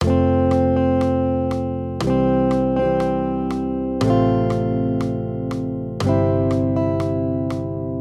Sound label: maj triads; accompaniment